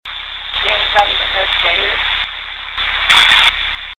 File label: ghost box